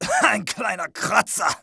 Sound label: hit, pain; mohaa